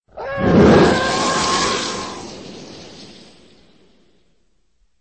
fly by

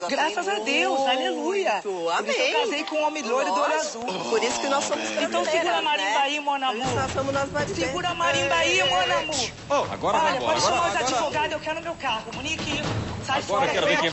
marimba

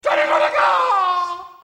torpedo; raiden

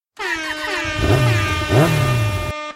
motorcycle start and revs

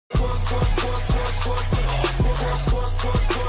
twerk

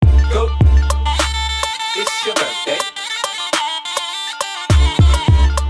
oriental remix

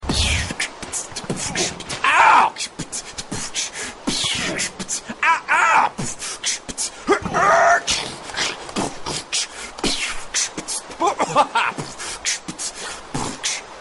beatbox

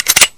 click clack

